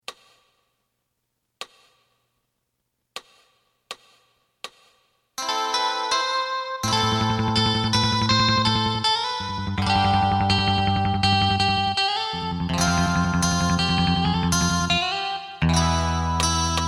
drum; エレキバンド用カラオケCD

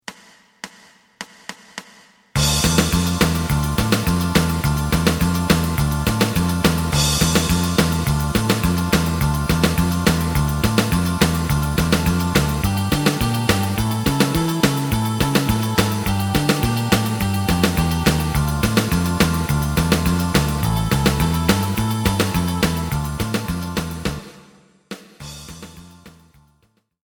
lead

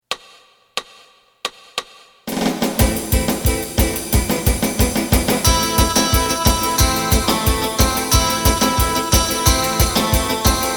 bass

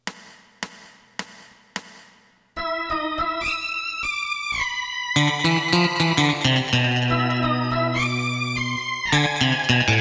drum; エレキバンド用カラオケ

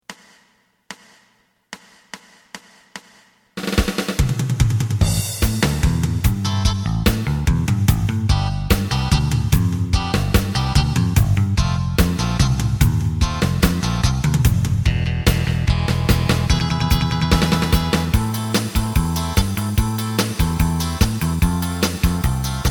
lead; エレキバンド用カラオケCD

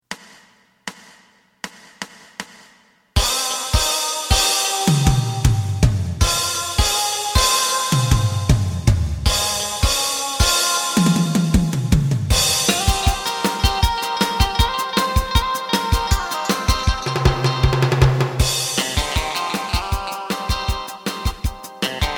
bass; エレキバンド用カラオケCD

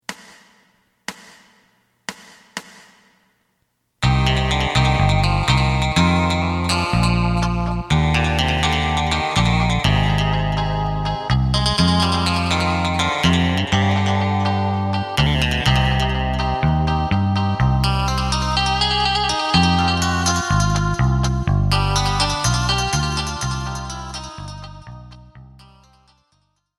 drum

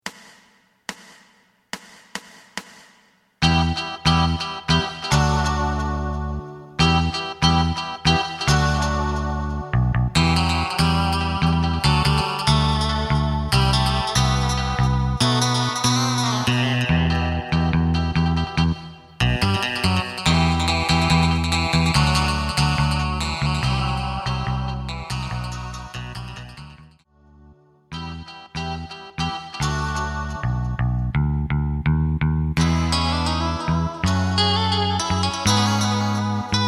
drum; エレキバンド用カラオケ

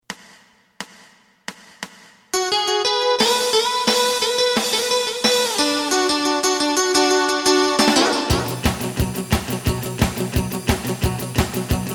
WILLY bass

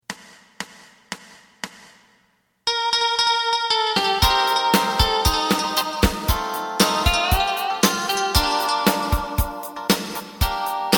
bass; エレキバンド用カラオケ

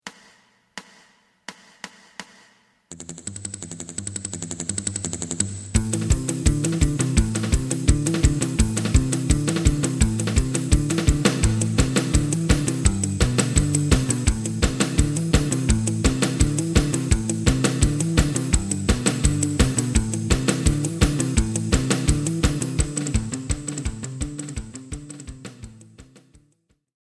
lead

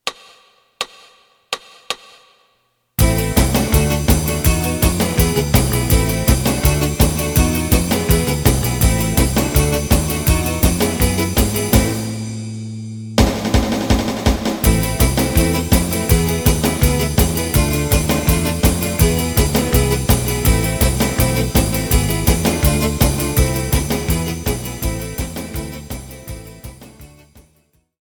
lead

